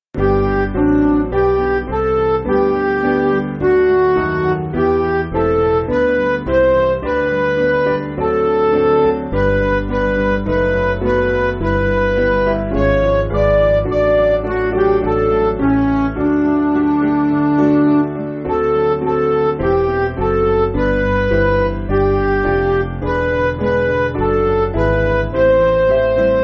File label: Midi